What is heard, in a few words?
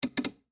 Television Set, Technology, Television, Set, Change, Uhf, Channel, Black, And, White, Tv; BlastWave